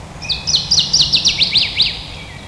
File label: waterthrush, birds